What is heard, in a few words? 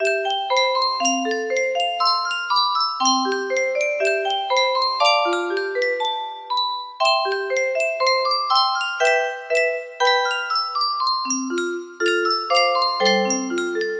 music box